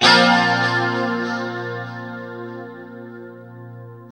2CHD A#3, Guitars; Spectrasonics; Hans Zimmer Guitars VOL-1; JC-SHEEN

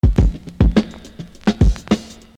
Beat, Hip-Hop Street Beats

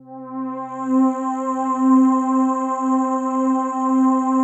PAD, DIGI-PAD

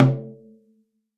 no snare, sticks; SoundBytes - Early Patches

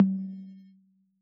analog tom; AnlgKit1

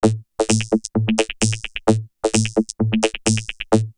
Vocoder Loop; X-Static Goldmine; VocoderLoops

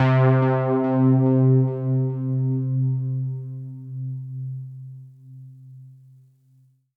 Synth C, Synth Prophet One Shots; Electronisounds

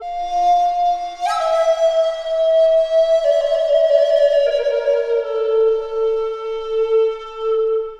Bamboo Flute, Ethereal Textures; Sonic Foundry (Sony Creative Software); Ma Ja Le Ethereal Textures; Flutes

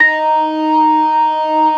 ORGAN SYNTH; InVision Interactive; Keith Emerson

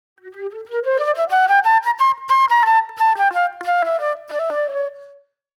Reflective Flute

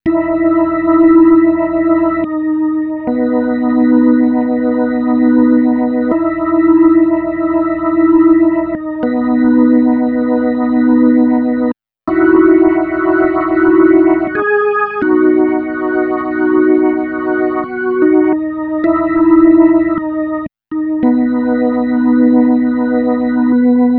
Little Organ, Electro Lounge; Sonic Foundry (Sony Creative Software); 080 Pinky

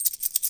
Small Change, household rhythm samples hits

